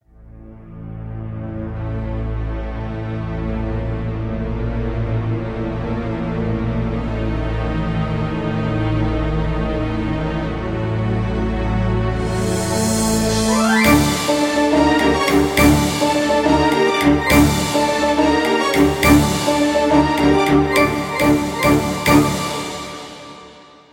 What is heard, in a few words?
Music; TR4; FMV Intro